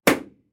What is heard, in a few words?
balloon pop